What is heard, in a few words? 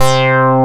Ring Mod C3; Digital Sound Factory Xtreme Lead